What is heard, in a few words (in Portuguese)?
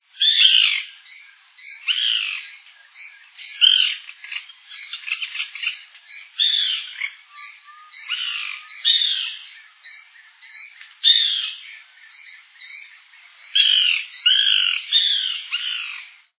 birro